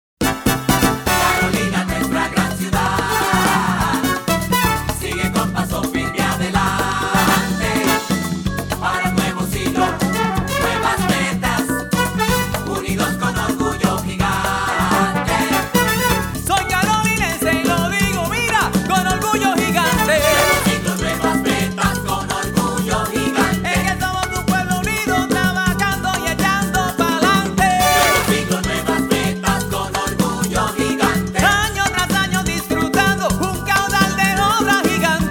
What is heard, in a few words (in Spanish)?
Jingle; Nuevo siglo nuevas metas